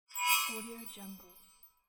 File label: cartoon teleportation